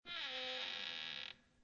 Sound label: creak long; snow white